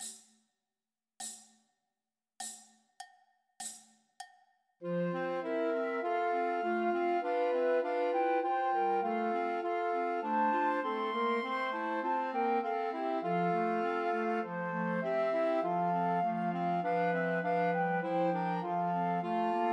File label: Trios for Two Flutes and Clarinet; Once in Royal Davids City; Christmas